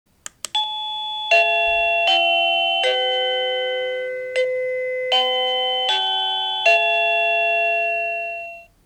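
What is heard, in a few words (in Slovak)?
Melódia k bezdrôtovému zvončeku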